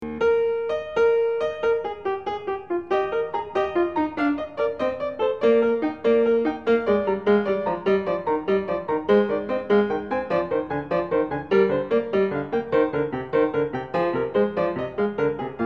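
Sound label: gigue, piano; BACH; FRENCH SUITES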